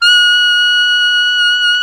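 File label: saxophone alto mezzo piano; Roland - LA Composer vol. 1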